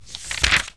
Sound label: page sound